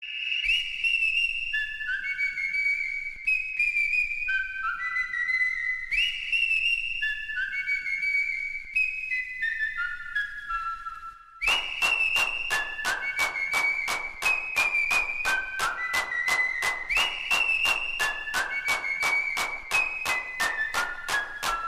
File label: Whistling